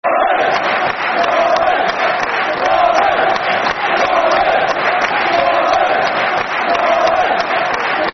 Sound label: Blue Army, Football Chants; Reading; Reading Rfc